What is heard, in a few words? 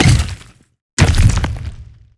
vertical sarcophagus open